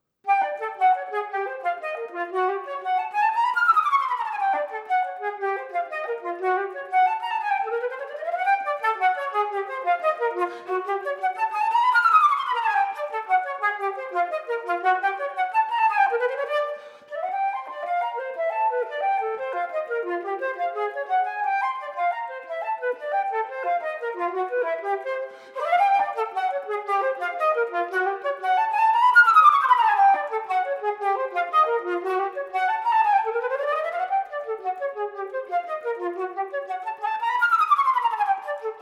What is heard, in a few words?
Flute Gigue Rondo performance; Flute Grade 8; Perkinson